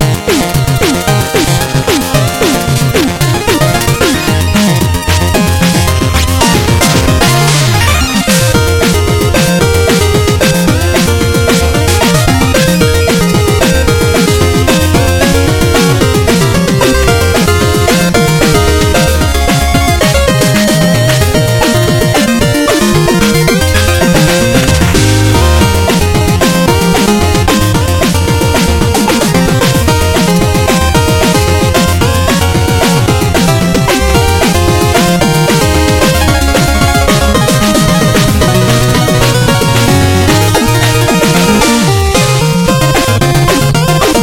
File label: Music Clockwork; Shovel Knight King of Cards